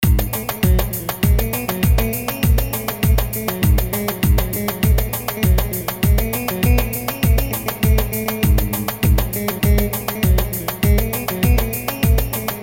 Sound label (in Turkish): kesik cayir